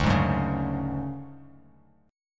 D Major 7th Flat 5th D down Bass Standard, chord; strummed; JGuitar

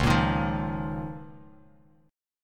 Db 6th Add 9th Db down Bass Standard; Db6add9 strummed; JGuitar

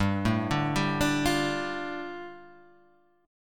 G 7th Suspended 2nd, Guitar Half Step Down

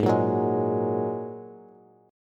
G sharp 7th Suspended 2nd G sharp Bass; G#7sus2 strummed; 16,13,16,13; JGuitar

